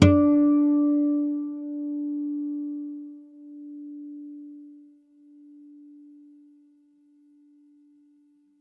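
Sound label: Clean D Harmonics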